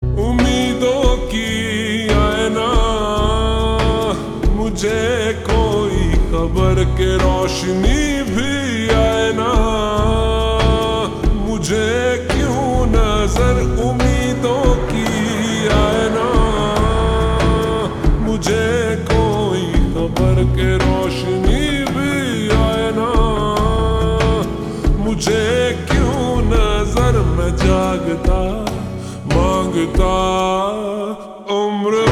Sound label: Ringtone Slowed Reverb